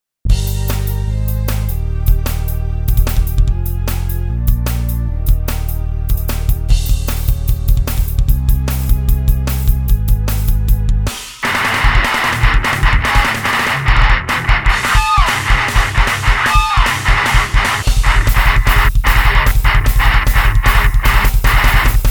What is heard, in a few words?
Gospel Chank